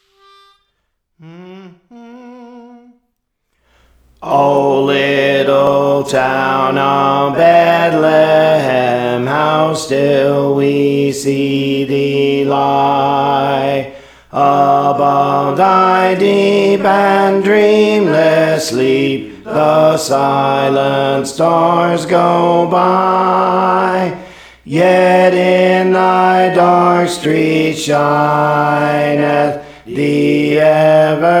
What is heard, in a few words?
Alto, Songs; Praise For The Lord Training